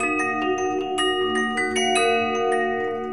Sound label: keys, Loops, Sad